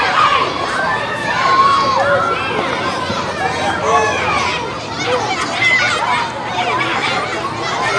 kids, crowd; AKAI S6000 CD-ROM - Volume 6

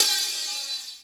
HH, Dance Mega Drums; Best Service; HH-HIP

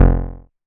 bass F1, Roland MKS-80

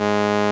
SOLINA, String Machines

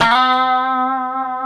C#4, guitars; COMPR STRAT; Spectrasonics; Hans Zimmer Guitars VOL-1

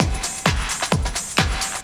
groove, DRUMLOOPS

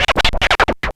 SCRATCHES; Scratch 1

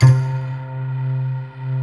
SYN GLASS; Sound & Vision - Gigapack I CD 2

